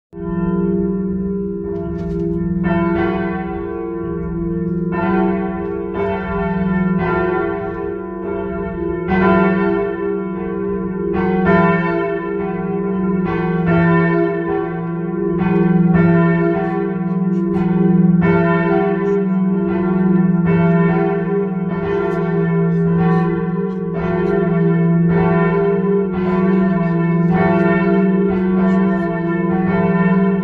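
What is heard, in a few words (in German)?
glocken geläut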